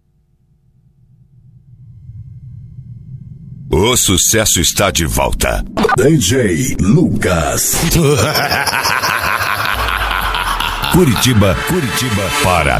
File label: mega funk, eletro funk